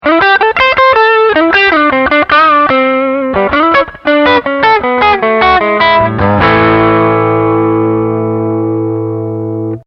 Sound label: semi, Gitarre; Semi-Acoustic Solo